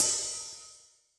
Open, HiHat; Yamaha DTXpress-II

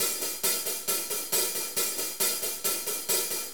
4-4 HiHat Loop; Drum Tools; 4-4 Drum Loops; HiHat & Ride Loops

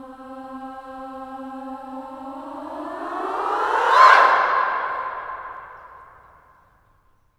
FEM SWOOP, SWOOPING

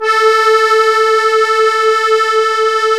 KEY, Concertina; Club-50 - Foundations Roland; Accordians

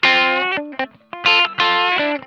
BLUE, Funk Guitar; Zero G - Funk Guitar

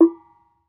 KETUK, Bronze Gamelan

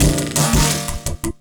LOOP, Drum & Bass, 170 BPM

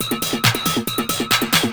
percussion loops 138; PS-45

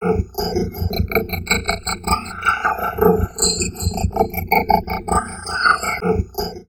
VOCOD, Drum Loops Crazy Processed

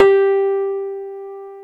G3, Keyboards Old School; USB Soundscan vol.09; AKAI

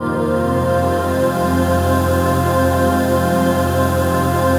PAD, Dance Mega Synth

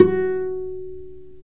STR VC PIZ, Chamber Pizz, String Master Series; Roland - String Master Series

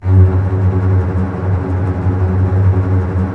F#2, Basses Tremolo, String Ensembles; Miroslav Vitous; 90 s Sample CDs

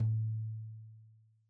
Tom2 Stereo, Deeply Sampled Drums; SMDrum

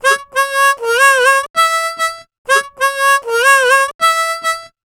Harm 098bpm A, Harmonica

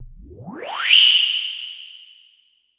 noise fx; Masterbits; Switch FX

